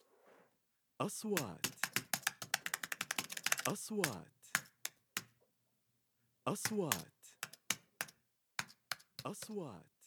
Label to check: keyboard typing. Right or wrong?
Right